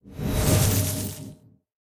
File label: Fight Regeneration; mighty party 2017 gamerip pc ios android